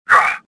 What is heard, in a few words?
land; Star Wars Jedi Knight II Jedi Outcast; XBOX